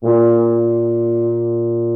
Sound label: Orchestral Brass